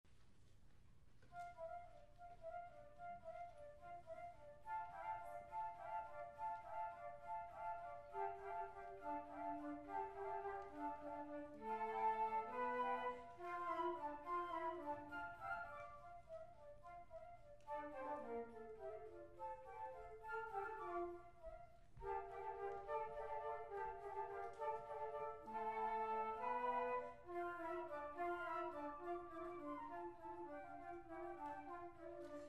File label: Flute Choir; All University Orchestra; Ukrainian Bell Carol